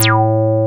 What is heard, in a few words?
TB BASS